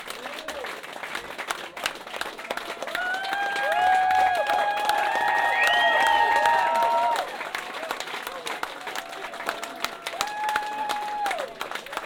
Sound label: applause